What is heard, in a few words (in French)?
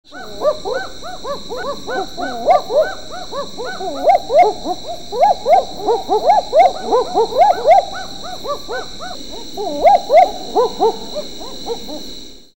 aboyeuse, chouettes